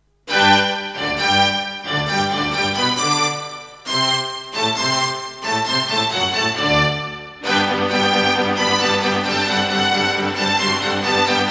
Eine Kleine Nachtmusik, Creative Sound Blaster AWE64 Gold; classical; Mozart; Eine Kleine Nachtmusik partial